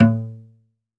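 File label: SOLO CELLO, Synclavier Strings; ILIO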